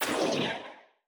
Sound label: hit lightning; Learn to Code From Zero With Godot; spells; bullets